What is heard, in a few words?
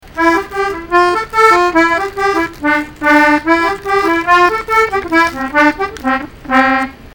accordion